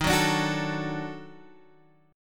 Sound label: D sharp Minor Major 7th Flat 5th D sharp down